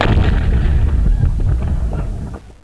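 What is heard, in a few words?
bomb explosion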